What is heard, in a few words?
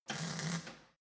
weld sizzle short soft